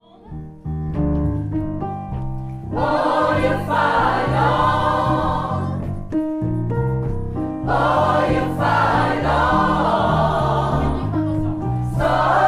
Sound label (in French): tous, gospel; refrain